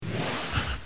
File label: Rollover